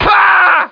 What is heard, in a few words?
die; OutLaws